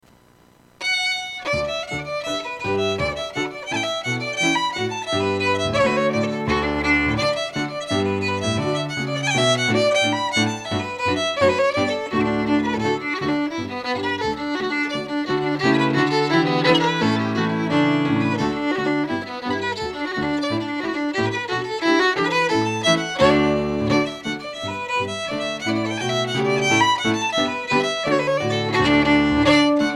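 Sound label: Reel, Scottish Fiddling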